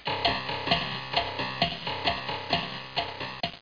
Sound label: worm